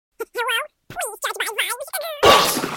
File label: vibes